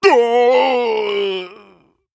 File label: Troll Emote Death